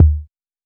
kick one shot tom; Kicks

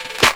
Snares; DRUMMA LINKAZ

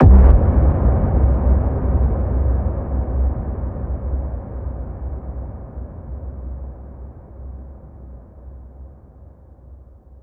FX (Edm Hit); BWB VAULT

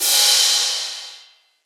Perc

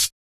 Closed Hats

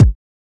Kicks

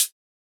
Hat; Closed Hats

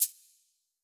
Drum Mix; DrumMix (194)

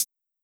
hi-hat, closed hats

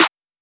Snareclap; Claps

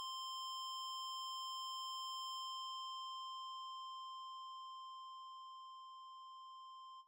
Sounds & Instruments

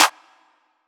Clap

